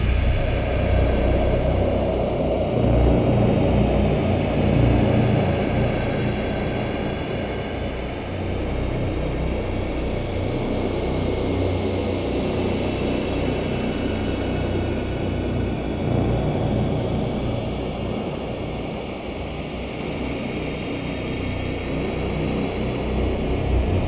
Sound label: ship colossus idle; synthqueen; Stellaris; machineage